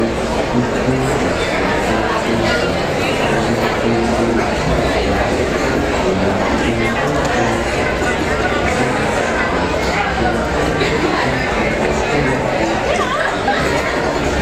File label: New Orleans Ambience